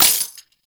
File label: Fist Claw Coin Hit; Warframe; Tenno; Incarnon